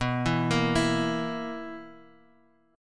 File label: B Major 7th Suspended 4th B down; BM7sus4; arpeggiated